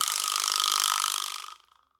Vibraslap A Pan; Latin Percussion; Drum Hits; Wood; Ableton; Factory Packs

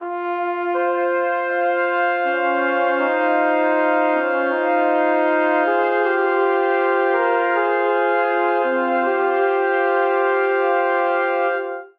Phrase Sentinel F Minor 80 bpm, Brass Quartet; MIDI Clips; Ableton; Spitfire Audio

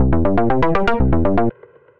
Arp, Synth Rhythmic; NewYork Escape Arp; Ableton; Factory Packs; Synth Essentials

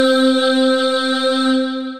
Subtle Lightwaves, Synth Keys

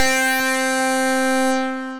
Morphed Pluck, Synth Keys

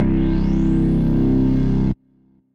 Grind Bass; Ableton; Factory Packs; Chop and Swing

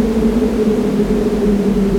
Resonant Wind, Vector FM

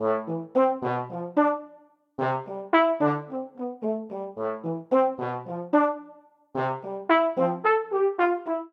Ostinato A Minor 110 bpm, Brass Quartet; MIDI Clips; Night Walk; Ableton; Spitfire Audio

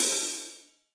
Hihat Open Multirod Dry; Drum Hits; Ableton; Factory Packs; Session Drums Studio; MPE Hits

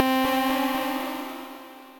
Synth Lead; Nigiri; Ableton; Glitch and Wash

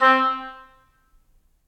Oboe Solo Staccato; Ableton; Orchestral Woodwinds

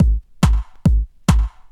140 House Kick Loop; Dance DJ; Drums; Kick Loops

